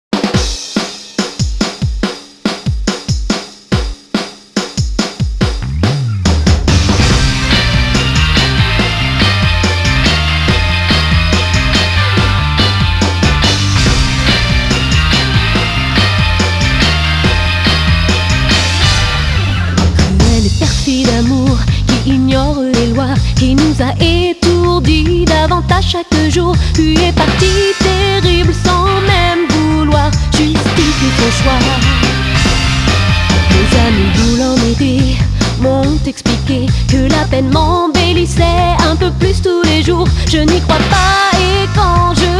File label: French